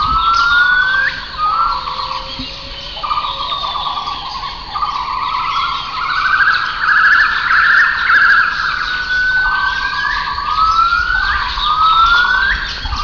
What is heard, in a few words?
Duet, gibbons